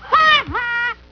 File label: "haha"